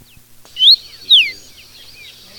Cardenilla, Paroaria capitata, Yellow-billed Cardinal; cantos